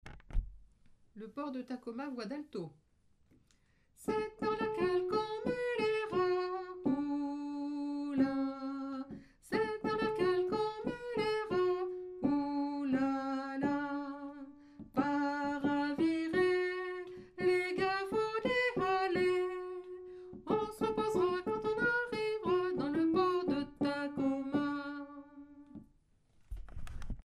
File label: alto